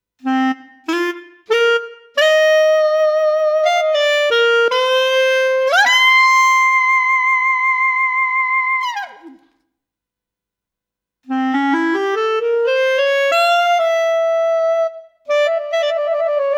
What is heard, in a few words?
Flute